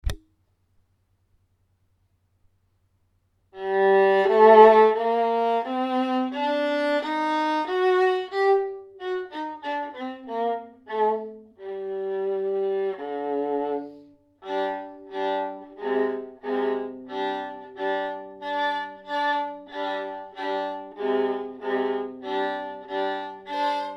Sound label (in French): intro alto